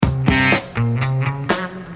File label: Sitar stab & guitar & drums loop